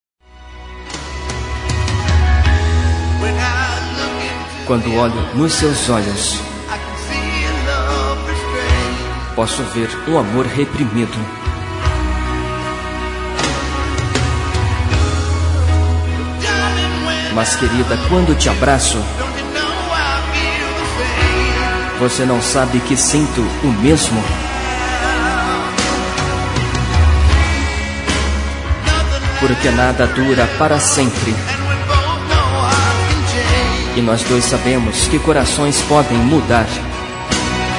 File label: (Traducao Voz); Traduzidas